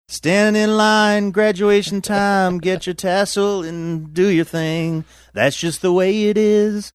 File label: singing stand in line graduation time